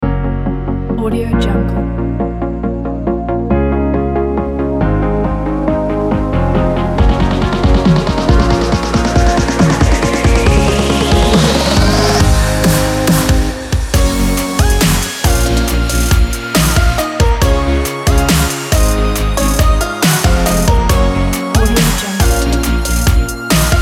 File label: summer tropical future dubstep